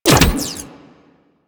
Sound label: Briefcase Launcher Mech; Warframe; Weapons; Corpus